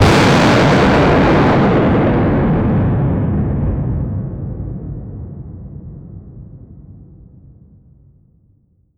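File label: explosion for a arcade game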